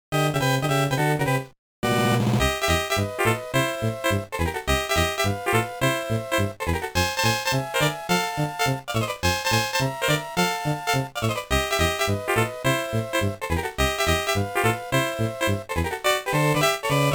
All Dragon Coins Collected; Super Mario Advance 2

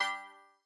Tune Notification

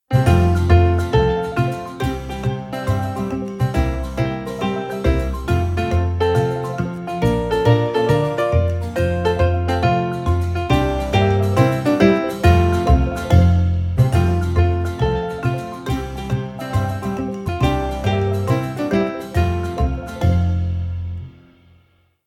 Ending; peppa pig world adventures

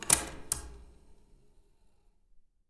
vhs entered; GameJolt

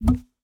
paperlauncher paper gun; teardown ps5 windows xbox series xs gamerip 2022

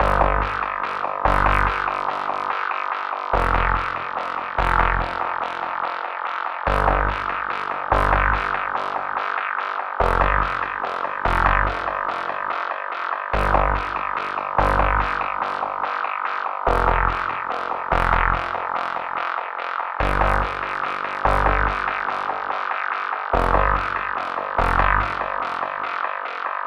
music for bass

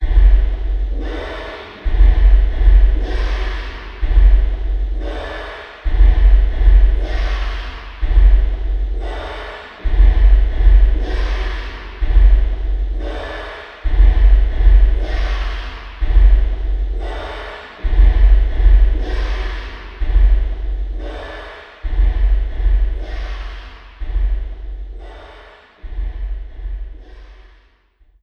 Boss Opening; Kettle

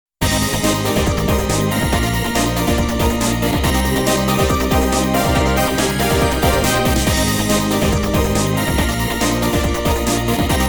ending theme karaoke; tv size karaoke

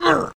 Dwarf Hit; The Battle for Wesnoth

